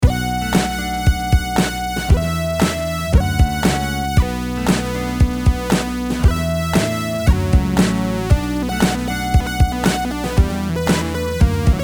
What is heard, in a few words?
2 osc classic